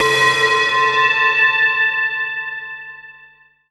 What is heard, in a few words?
atmo; Techno Trance Essentials; FX & ATMO